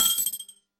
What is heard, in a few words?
cash